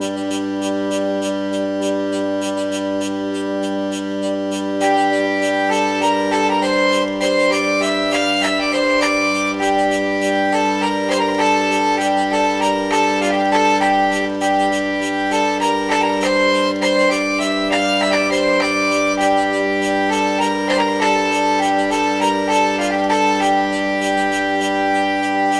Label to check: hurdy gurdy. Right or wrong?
Right